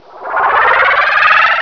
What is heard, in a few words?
ALERT